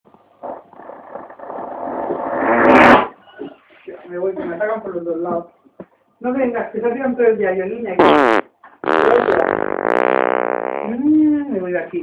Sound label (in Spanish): Padre e hijo, pedo